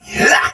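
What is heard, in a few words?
Exertion Soldier Sword Agent Male, Battle; Sinecure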